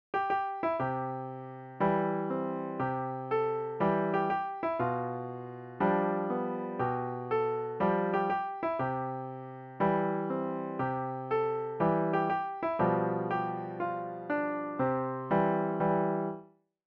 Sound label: hes got the whole world piano